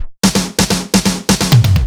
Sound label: Fills 128BPM, Clubsounds